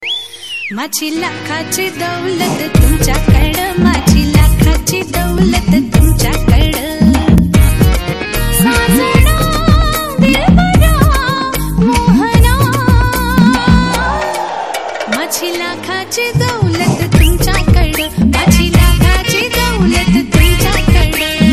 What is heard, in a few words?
Marathi lavani tune ringtone